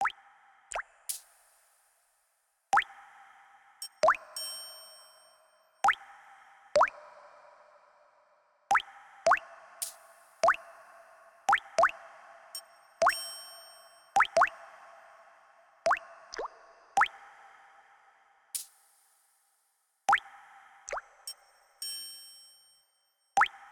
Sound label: water triangle test lexverb, Water drops + Triangle, reverb